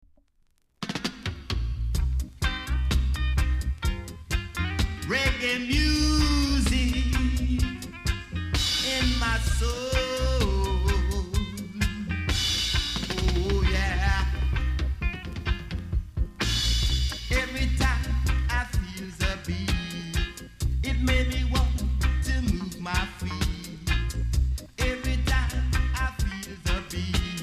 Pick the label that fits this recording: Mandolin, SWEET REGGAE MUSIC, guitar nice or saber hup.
SWEET REGGAE MUSIC